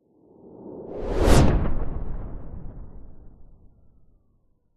sound design cinematic whoosh fast into collapsing air tail; zapsplat